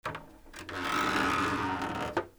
SQUEAKS

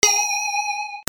BELLS

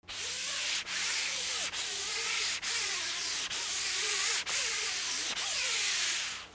FRICTION

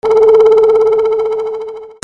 WHISTLES

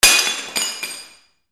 FALLING

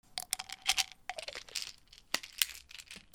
BATHROOM

